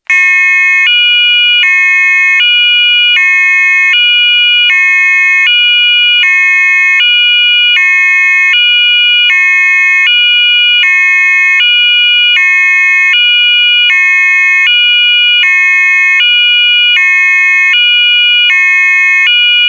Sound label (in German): Hella Stadt Signal